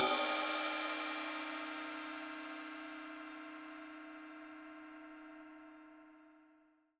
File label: CYM, Real Drum Kit, FUNK KIT; REAL DRUMS